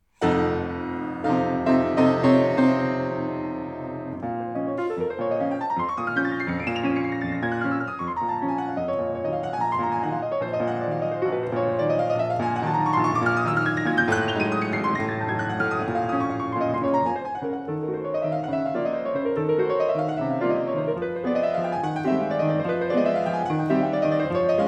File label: Chopin Prelude B Flat Minor